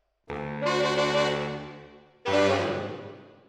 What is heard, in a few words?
MKH AB, piano